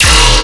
Bass Hit D#, Dubstep

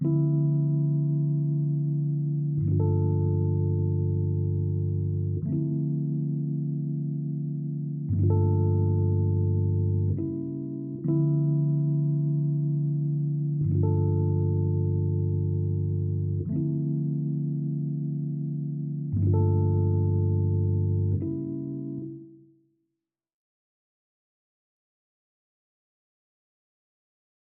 87 BPM D Min Rhodes, Afrobeats; Melody; Loop Stems; Waterfall; Cymatics

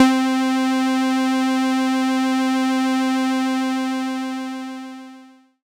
Jupiter-8 LEAD Pulse - C; Synth; One Shots; Cymatics; Fantasy Synth Sample Pack

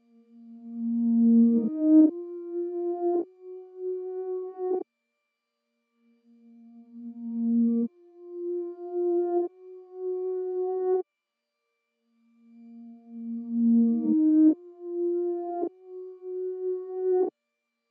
154 BPM B Min Synth; Melody Loops; Into Pieces; Cymatics; ANALOG EVOLUTION Beta; RnB Collection

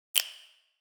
Space Drum Loop - 95 BPM Snap; Loop Stems; Cymatics

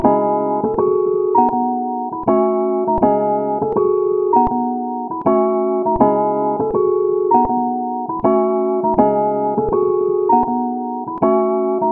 161 BPM C# Min Piano; Trap; Loop Stems; Cymatics; Cadet; 2022 Melody Collection

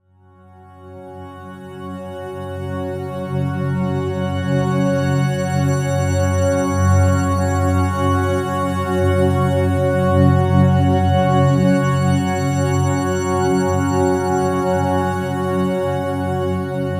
Pad F#; One-Shots; Pads; Ghosthack; Color Bass Essentials

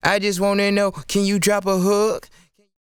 Vocal Phrase - I Just Wanna Know - 170 BPM, Dry; Vocal Phrases; Cymatics; Euphoria Vocal Sample Pack

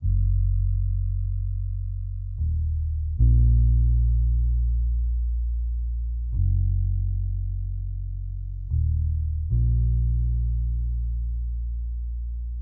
76 BPM A# Min Bass, Lofi; Melodies; Loop Stems; Cymatics; Lofi Starter Pack; Misty Eyed

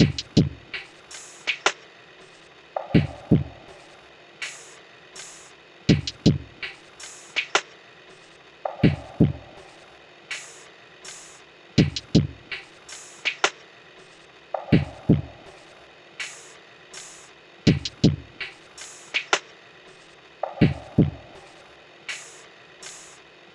Line Of Fire Perc Loop - 163 BPM; Cymatics; Fiji Hihat Loops & Percussion

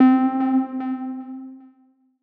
PLUCK Echoes - C; Synth One Shots

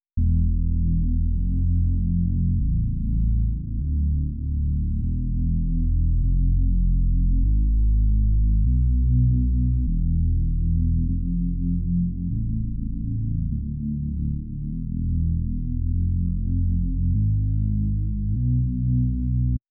99 BPM A Min Lead; Melody; Loop Stems; Cymatics; Infinity Melody Collection (Preview); Emotions

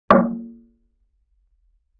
Barrel Hit, Vintage Cartoon; Drums & Percussion

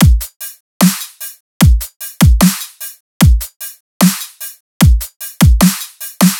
Dubstep Drum Loop Full, 150bpm; Full Drum Loops (Stem Seperated); Ghosthack; Dubstep Sound Arsenal

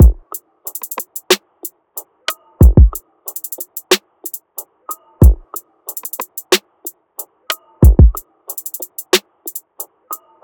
Freefall Drum Loop - 92 BPM; Cymatics; Vibes II Drum Loop Collection

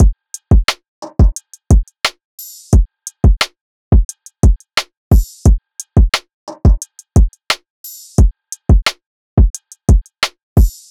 Drum Loop 177 BPM; Suave; Cymatics; Mystery Pack Vol 4

